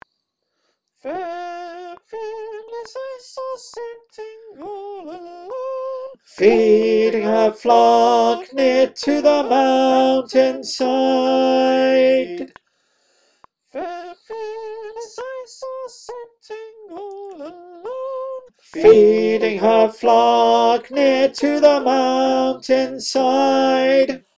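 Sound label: tenor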